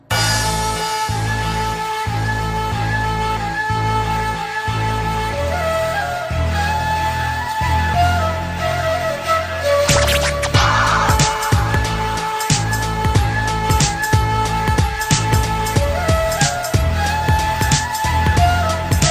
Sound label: arabic instrumental